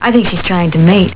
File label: mating; species